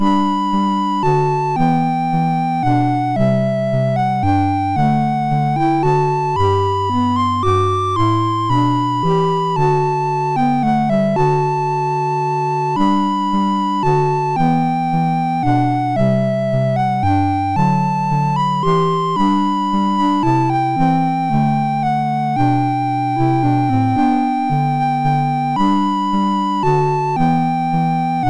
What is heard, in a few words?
Irish Castle B, MOS6581R3